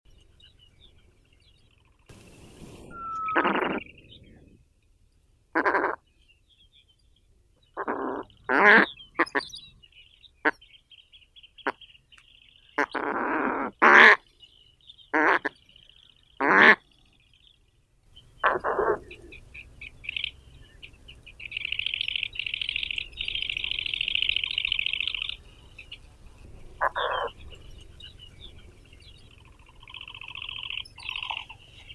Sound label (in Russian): gaga sibirskaya polysticta stelleri